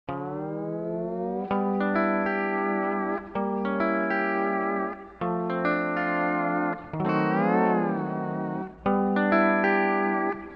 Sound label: Guitar and Steel Guitar